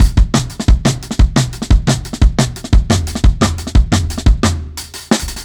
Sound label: Rough Beat 4 Bar, Drum n Bass; KJ Sawka; Drum n Bass & Beyond